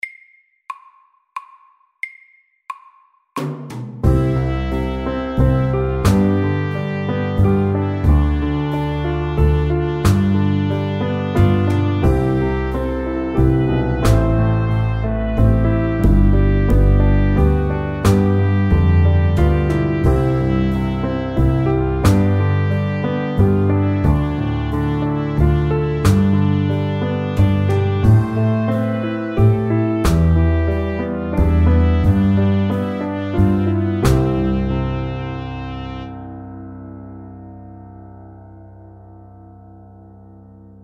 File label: Soft rock FAST 90